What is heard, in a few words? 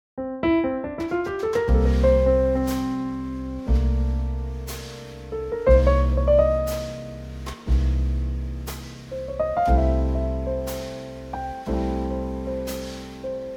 F Trio; key - F - vocal range - C to D; Gorgeous Trio arrangement of this dreamy ballad